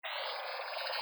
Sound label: keyboard right slanted